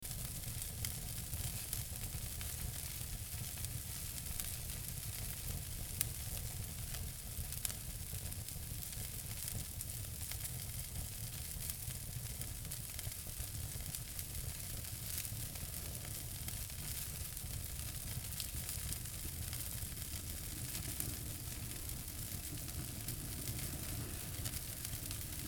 dry grass burning in open fireplace

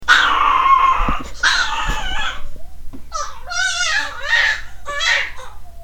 Howls

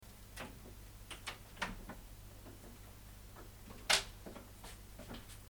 closing an old door

